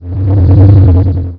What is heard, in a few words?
noiz proc